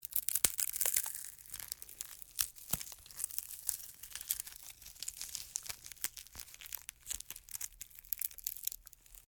Wood Break Snap Crack Foley; Wood Sound Effects; Materials; Wood Break Foley Small Snap; BBFX

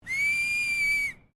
FEMALE WHISTLE MEDIUM; Female vocal sounds; Whispering Sound Effects; Whispering (Sound Effects:Voice)

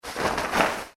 clothing trousers movement; Trousers/Pants Movement; Dressing; Human; foley; McKinney Sound

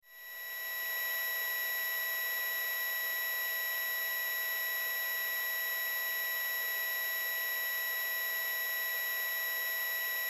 Science Fiction Computer Screen High Pitch Static

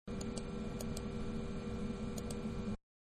Mouse Clicking Three Times, Computer; Computers (Sound Effects:Electronics); Manhattan Production Music

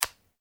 PHONE MOBILE FOLD OPEN, Japanese cell phone; Cell Phones (Sound Effects:Electronics)

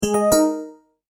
multimedia tone correct; Tones (Sound Effects:Sound Design); McKinney Sound

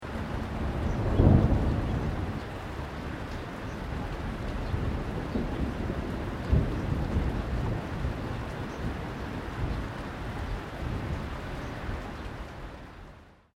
THUNDER DISTANT LOW LIGHT RAIN BIRDS

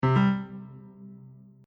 Correct Double Ding

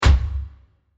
Bursting Hit; Burst Sound Effects; Bursts (Sound Effects:Impacts)

